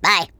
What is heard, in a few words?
crash cashout